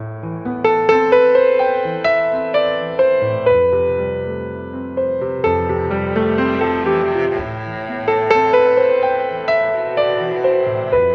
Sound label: piano, Loops, Sad